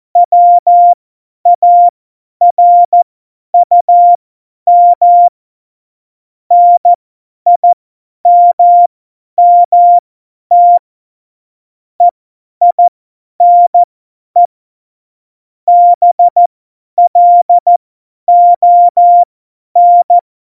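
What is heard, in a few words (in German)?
Witz, Tempo 70, Morsekurs, cw morsen; Witze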